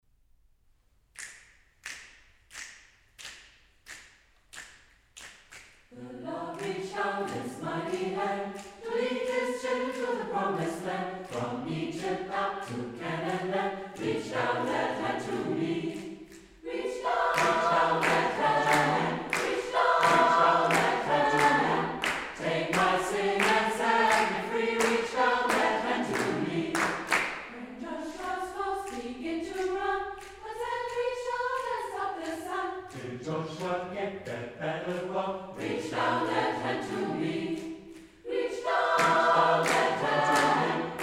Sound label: Tutti